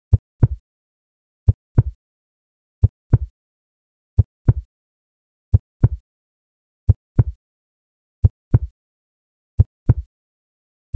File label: Heartbeat sound effect